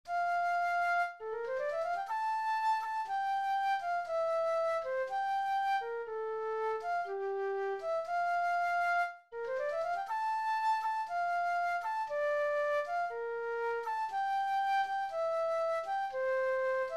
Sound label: Prelude F Major